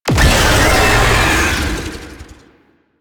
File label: Tank Infested Vocal Pain; Enemies; Barks; Warframe; Lasrian